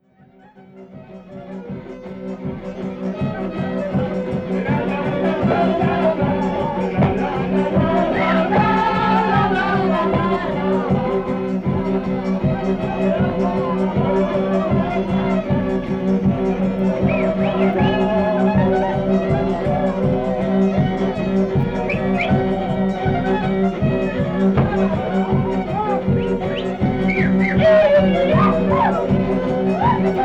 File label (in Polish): polka; Kranów